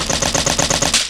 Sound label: Sci-fi Malfunction Rumble